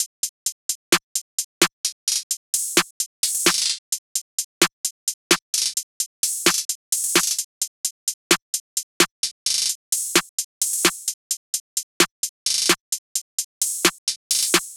beat loop 130

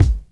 Boom-Bap Kick; Kicks

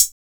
HiHats; Closed Hats

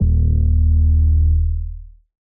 808s

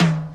Closed Hats

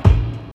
kicks

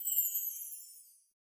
FXs

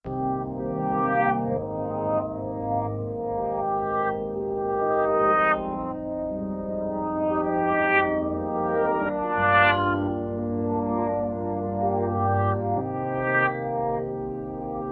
Instrumental

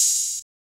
Open Hat

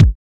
kicks

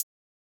Closed Hat; Closed Hats

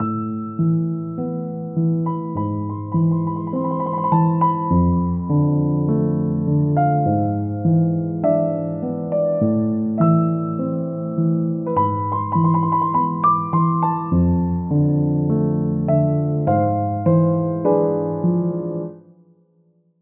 melodic loop pioggia Amin

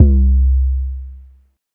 808s; Blang

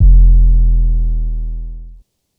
808 Distorted Kik Long

